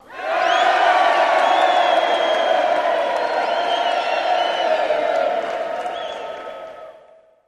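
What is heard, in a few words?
Crowd Medium Reaction, big arena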